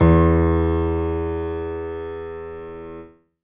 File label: keyboard acoustic, acoustic grand piano